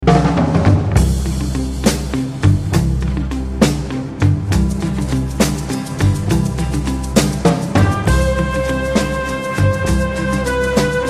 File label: tone; CHAPLIN